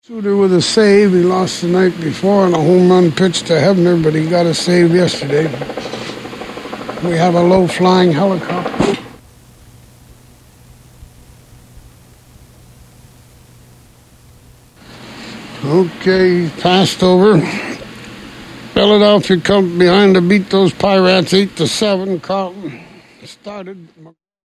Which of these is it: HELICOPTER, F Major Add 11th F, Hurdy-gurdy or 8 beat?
HELICOPTER